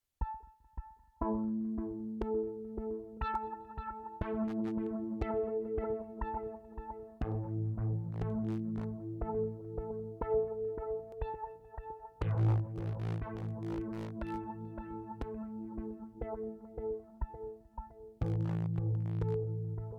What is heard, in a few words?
Pedal Delay Synth Fat Phaser Tape Hardware